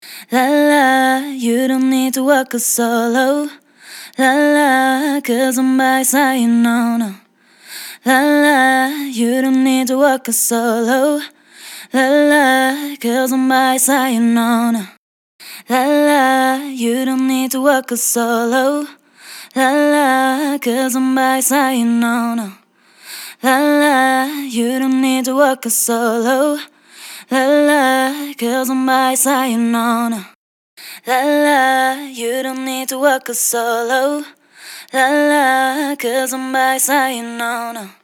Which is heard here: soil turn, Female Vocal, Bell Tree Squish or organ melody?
Female Vocal